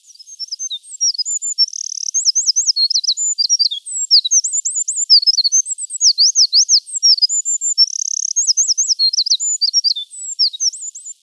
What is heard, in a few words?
wren 2B